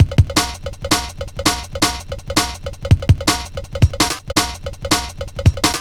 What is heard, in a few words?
165 Bell, Loops, breaks; M8